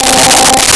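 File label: Distortion Guitar C5; Nintendo; July 2020 Gigaleak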